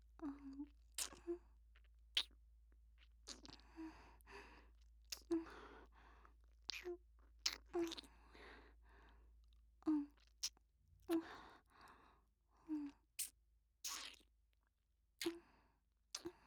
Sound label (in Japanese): お姉さんのキス